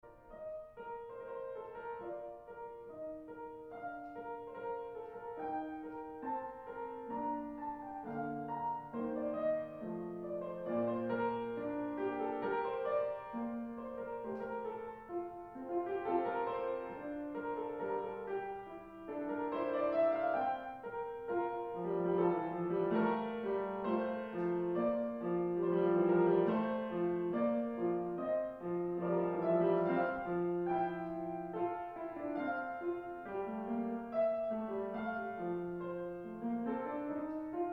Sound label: Piano mixdown